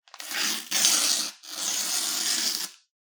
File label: tape pull; soundscrate